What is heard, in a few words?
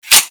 handgun slide